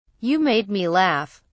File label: laugh